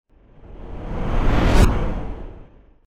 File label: whoosh